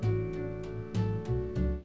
Menu

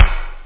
verby kick; OctaMED SoundStudio V1; jazzies samples; perc

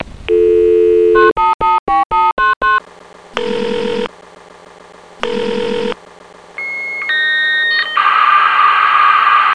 Dial-up; BibleLand

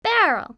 barrel

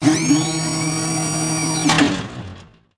HATCH; DOORS

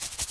scratch, rat; Heretic II; monsters

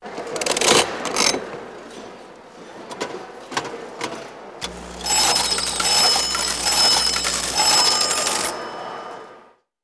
Slot Machine, Machinery; sound effects

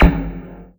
shot; wob2 demo

